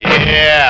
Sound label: yeah SUNG